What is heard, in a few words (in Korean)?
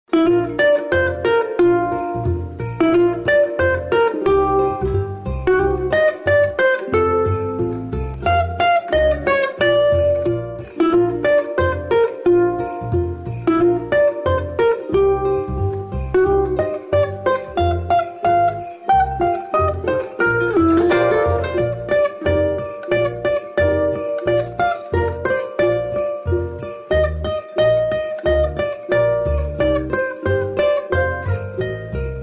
징글벨 (Jingle Bells) - 우쿨렐레; LG; LG X-Mas